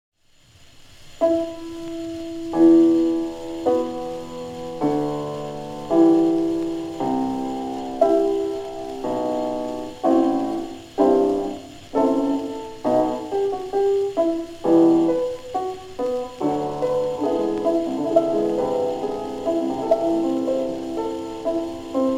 Violin and Piano; Le Deluge, Op. 45

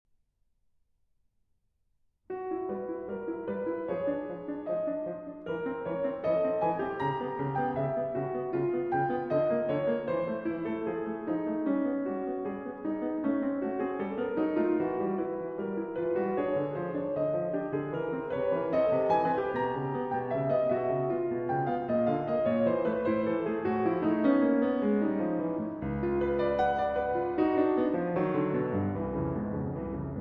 Piano Sonatina, Allegretto vivace

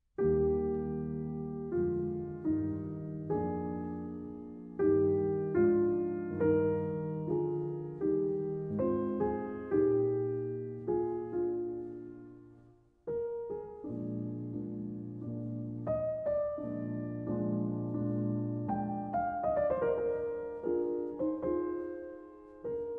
Adagio in E Flat; Arr. Ólafsson from String Quintet No. 3 in G Minor, K. 516

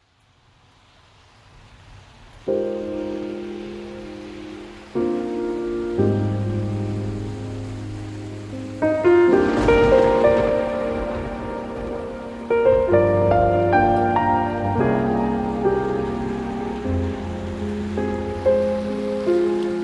Piano and Rain

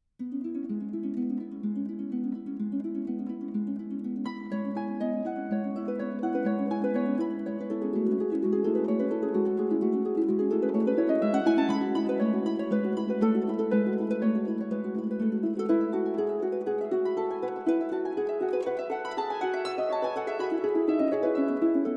Harp Sonata Perpetuum Mobile

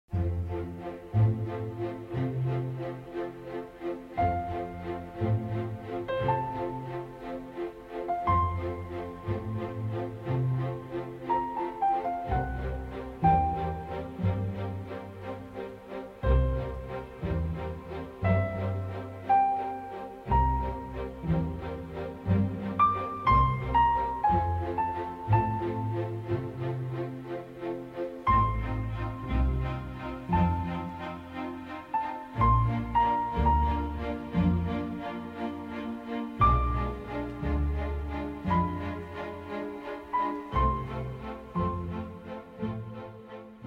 Piano Concerto No. 21 in C Major; With The Royal Philharmonic Orchestra

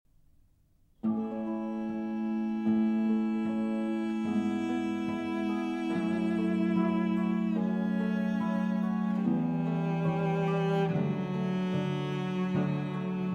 Sonata For Cello and Continuo in B Flat; Vivaldi

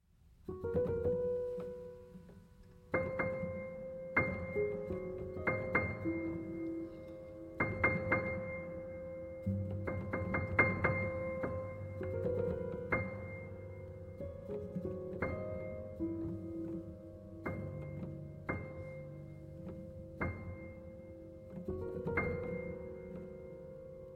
Twittering, Upright Piano

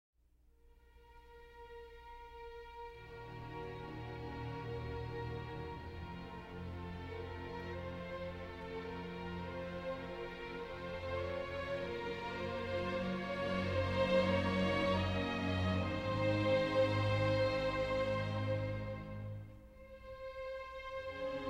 Adagio for Strings; Platoon, 1986

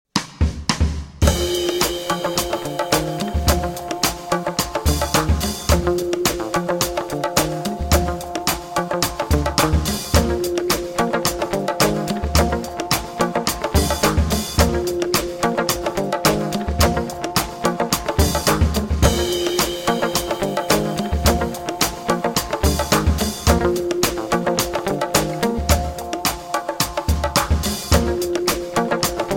Instrumental Funk

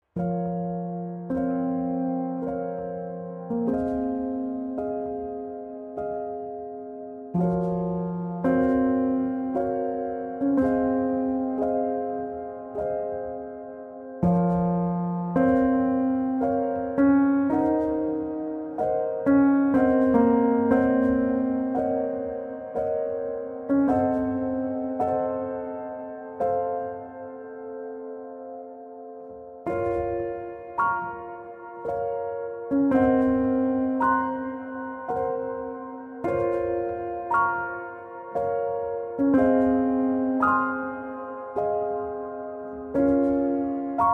At the Violet Hour (Solo Piano Version)

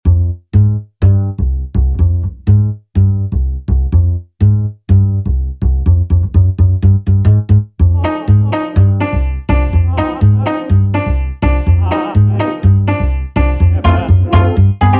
Electric Swing

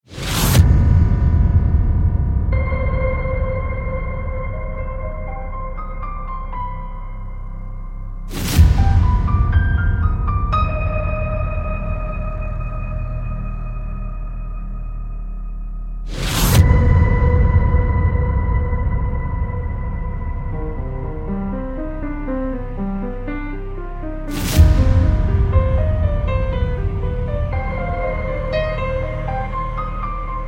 Solfeggietto in C Minor

